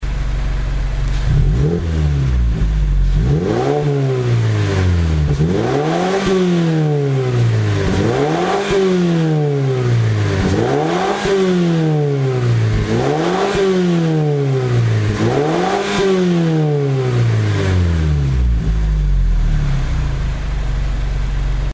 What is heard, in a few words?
Mazda CX5 SkyActiv Muffler Audio; AutoExe